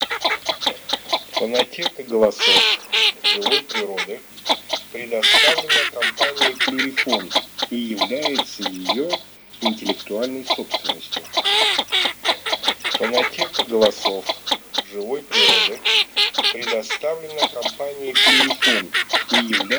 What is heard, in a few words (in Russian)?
Чирок-трескунок Marzaiola; plurifon